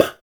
PUH, TEK PERC, Drums; TEK PERC2

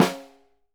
SNR, Synclavier Percussion 2 - World & Orchestral; ILIO

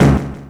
PERC FX, Dance Drums

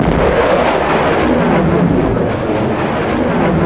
JET; East West - Global Sounds

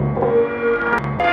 Fx Vox; Equipped Music; Loungin House

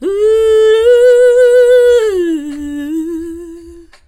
FEM VOX, Hip Hop; E-MU Formula 4000 Series Vol. 1; Hip Hop Nation; Phatt Phemmes 1

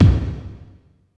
KICK, Tekno Industrial; East West

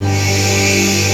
NITROUS; NorthStar - The Wizard VOL-2; THE VOID

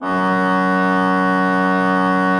F2, Cathedral Organ, HOLZPRINZIP; Propeller Island

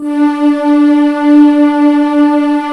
syn vox string; jazz jackrabbit 2; M8

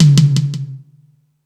Space Drums, E-MU Pro-Cussion; drum machine

